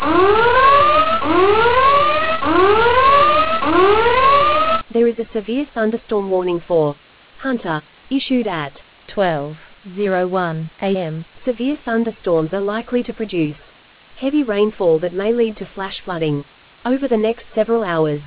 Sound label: storm audio